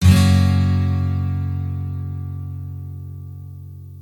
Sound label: F MAJOR BARRE, guitar